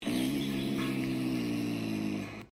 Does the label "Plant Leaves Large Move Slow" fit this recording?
No